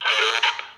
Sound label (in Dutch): hij lacht; hooligan